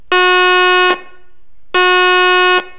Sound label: horn; Goose #5